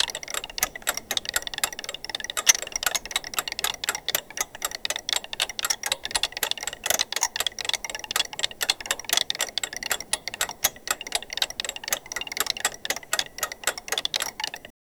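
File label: many steampunk style music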